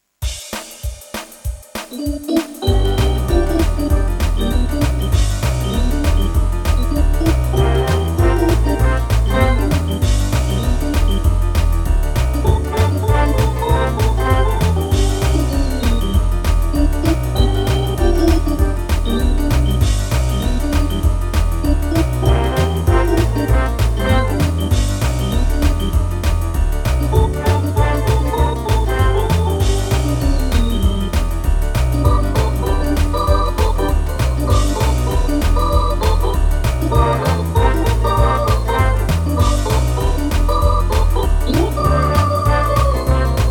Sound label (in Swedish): Snabb Boogie Blues